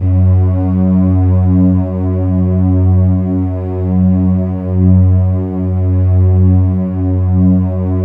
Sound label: Keypad; Standard Instr; Keypad 2